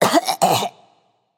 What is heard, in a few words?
clear throat; smite; babayaga